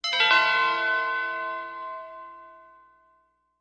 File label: Music Effect; Quick Arpeggiated Tube Bell.